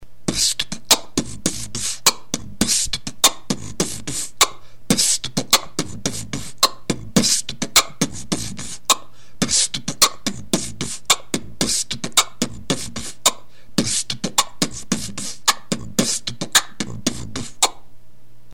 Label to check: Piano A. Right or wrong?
Wrong